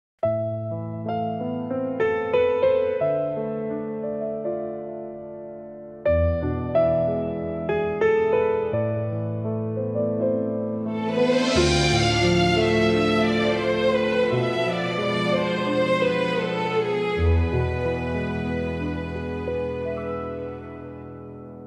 Romantic Neoclassical Instrumental, Emotional Soundscape